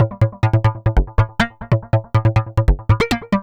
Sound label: Pinch Synth